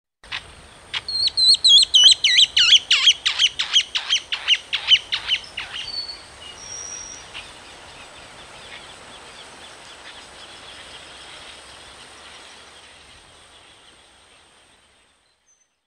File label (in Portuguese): Martim pescador pequeno; Pantanal